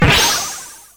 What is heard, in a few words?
SFX; Narco Police